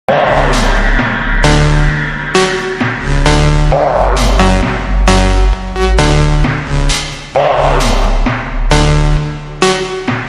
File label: phonk space ultra slowed